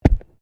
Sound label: Body movement Organic Impact Heavy